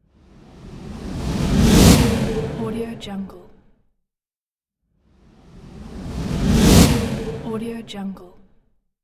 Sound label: flutter